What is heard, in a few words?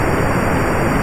craft loop